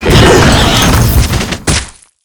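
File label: guardian snake attack, monster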